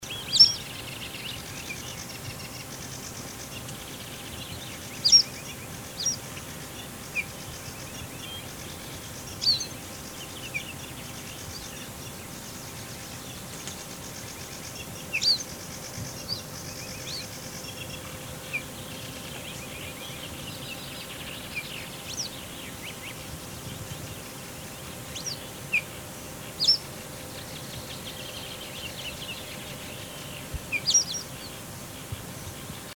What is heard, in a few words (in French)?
bergeronnette printaniere, chant